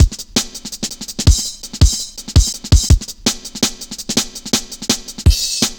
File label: Virtual, Drum Loops, 165.5, Jungle